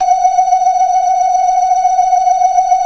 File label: 90 BLOOD L 2, ORGAN; Keith Emerson Signature Series